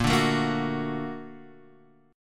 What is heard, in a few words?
A sharp Augmented Major 7th A sharp, Guitar Half Step Down